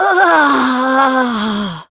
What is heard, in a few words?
Death, Barks; Kuva Lich; Persona B